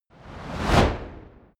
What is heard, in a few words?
fire torch bright whoosh; swooshes-swishes